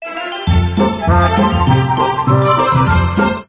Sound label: music tube; video games; pyro; Source Engine; tf